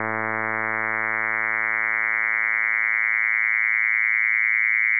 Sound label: trainlets